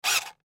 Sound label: Power Drill Tighten Screw Single Revs; Drills; Tools; Industry